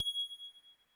tink

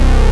juno chorus low

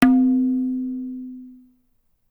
tabla; Dirt-Samples

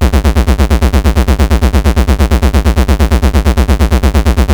thrust

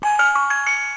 Musical Medley Notes, toys

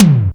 DX Hi Tom

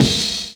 CUT

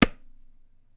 tabla; Dirt-Samples

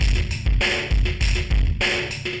ind dist

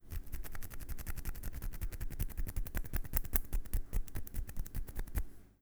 hand mono

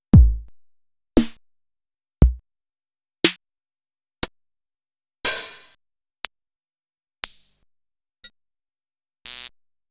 stabs

